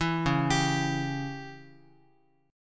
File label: Db Diminished Db down Guitar; arpeggiated; JGuitar